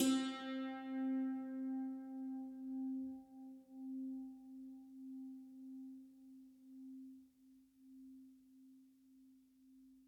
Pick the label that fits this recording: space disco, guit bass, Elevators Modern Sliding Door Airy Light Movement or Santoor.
Santoor